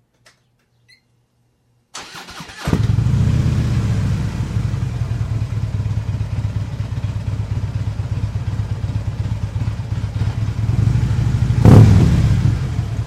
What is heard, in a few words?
HiFlow Street Cannon